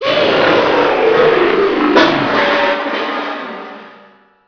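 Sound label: dragon death; eqclassic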